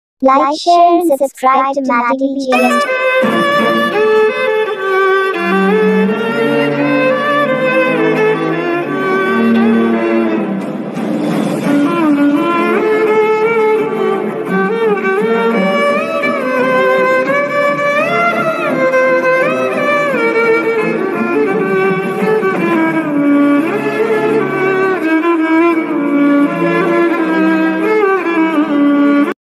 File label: new amma bgm ringtone telugu